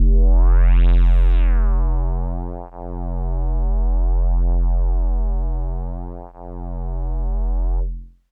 Hologram Kawaii F0; keys

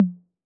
Tom Hi C 808, Clean Digital

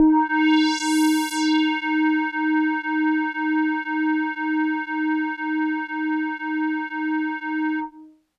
Hologram Kawaii Ds3; keys

